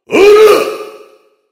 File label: heavy meleeing